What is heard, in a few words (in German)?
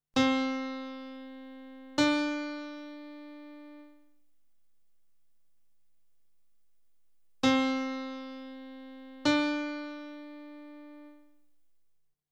Intervalle Sekunde